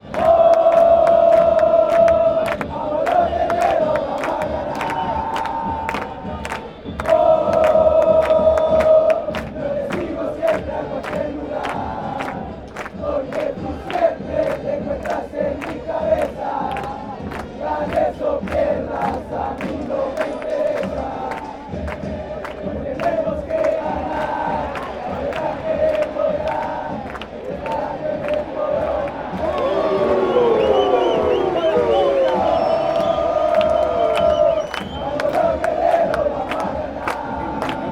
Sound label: ooooh, fanchants